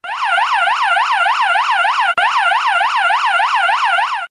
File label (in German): Sirene Polizei Yelp